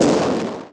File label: explode; blitz3d; bb3d asteroids